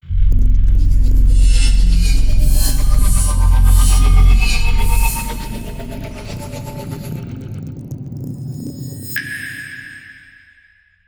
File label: Logo big